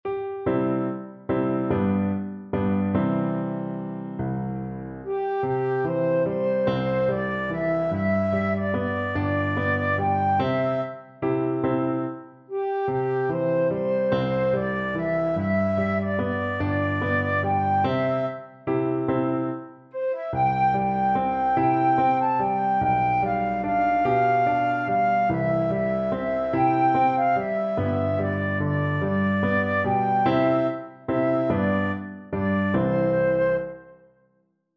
flute

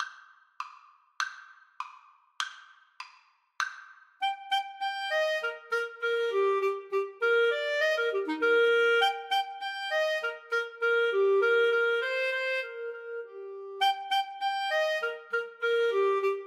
allegro, Clarinet Duet; duos

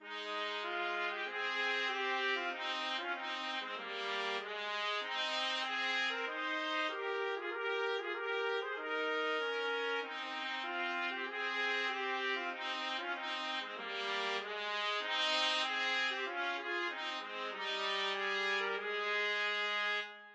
Hail to the Chief trumpet; trumpet duet

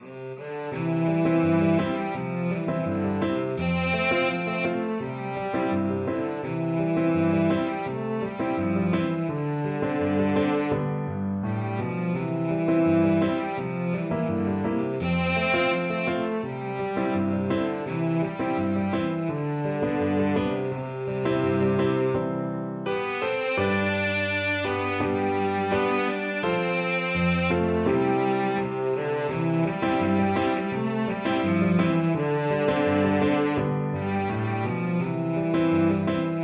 cello

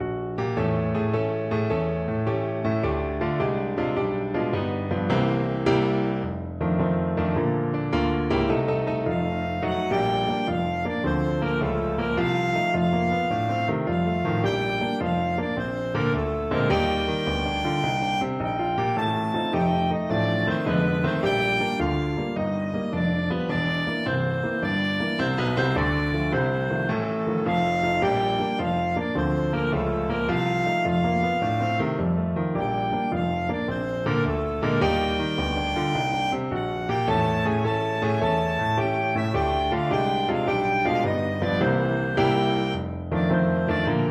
beside seaside, clarinet

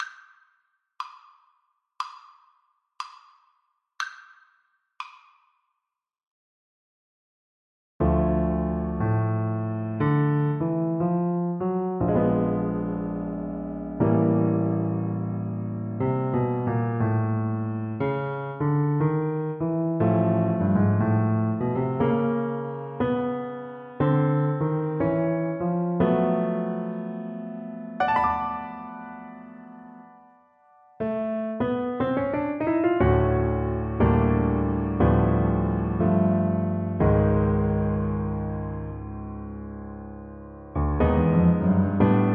jazzy birthday violin

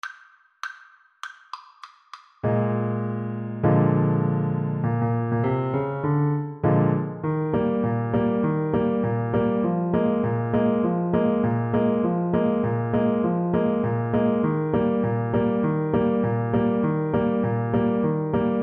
Clarinet

